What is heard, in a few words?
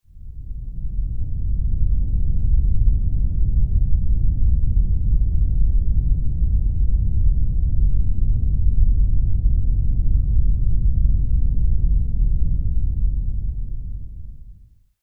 red noise heavy bass with tones; deep sleep